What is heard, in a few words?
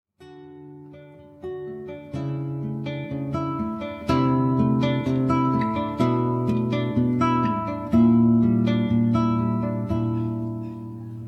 singing